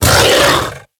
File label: Kubrow Feral Vocal Angry Attack Short; Warframe